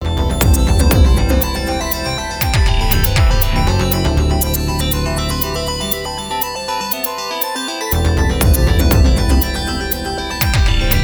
Quirky Pretty Beautiful Synth arpeggios with hard kicks; CUES for film TV games etc POSITIVE